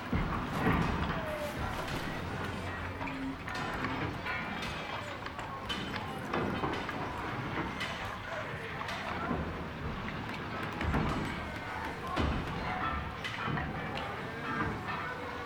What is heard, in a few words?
shipyards, ambience